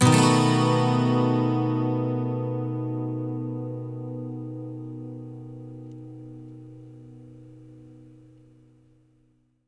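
12 string major B, Electric & Acoustic Guitar Loops; USB Soundscan vol.04; AKAI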